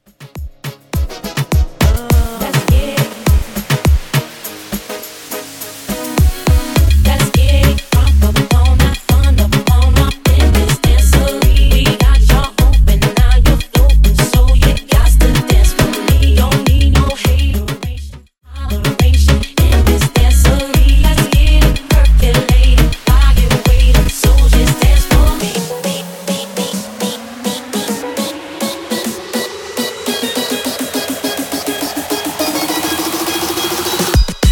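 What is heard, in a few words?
Reggaeton Clean 103bpm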